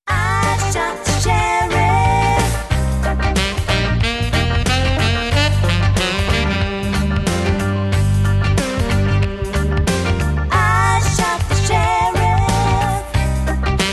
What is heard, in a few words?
Tenor Sax